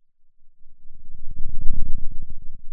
pop, Sound of a thunderstorm

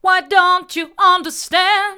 why don't you understand hard, vocal

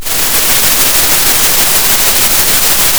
Sound of a thunderstorm

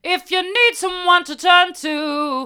hard, vocal

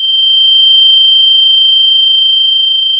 Sound of a tea kettle whistling

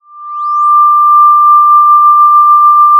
Sound of a police car siren

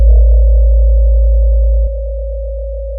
pop, Sound of a church bell

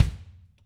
Acou Kick, drum

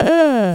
achievement low; VIVAE

